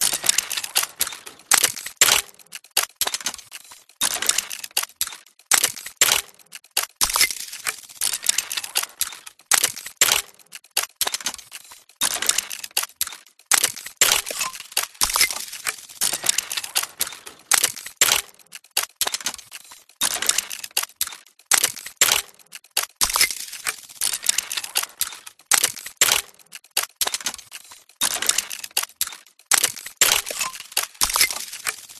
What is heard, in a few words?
Steampunk Mechanical Loop 120BPM; PMSFX